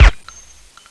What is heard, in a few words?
bizon shoot